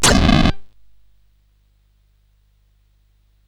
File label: Shuffle, CSG8580R5